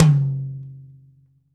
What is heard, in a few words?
high floor tome drum sample c sharp key; Duration 0:02